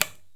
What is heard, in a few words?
INDIAN PERC